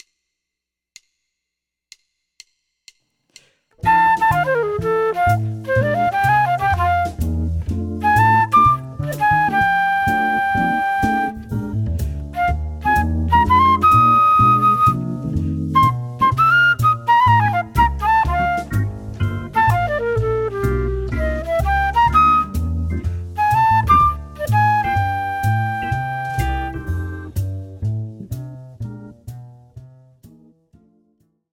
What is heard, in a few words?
F blues